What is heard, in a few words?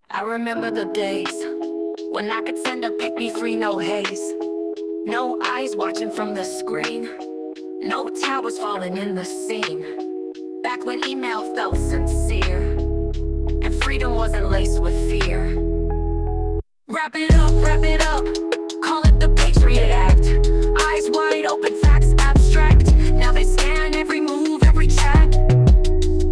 hip-hop